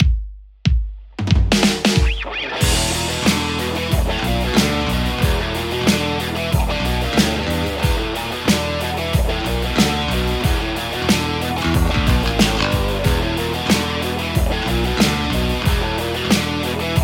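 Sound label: Stratocaster; overloud th3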